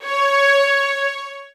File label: SOFT VIOLINS; Q Up Arts; Denny Jaeger Master Violin Library